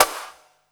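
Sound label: snare drum -R, 168 BPM Drum & Bass; Ueberschall; Drum & Bass Resonance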